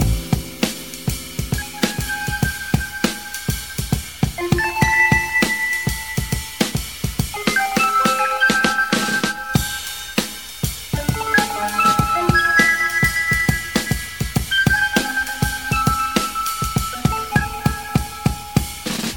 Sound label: hammond riff